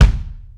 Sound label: Bobo Kick, Kick One-Shots; KJ Sawka - Drum n Bass & Beyond; Drum Tool Box